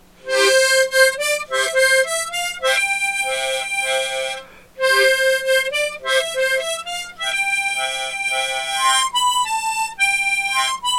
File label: Tremolo; All Creatures of Our God and King